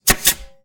Impact Nail Gun Single Shot Compressed Air and Action; Fascinated Sound; Scene Shop Tool Box